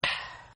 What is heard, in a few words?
Gargle End; Gargling; Voice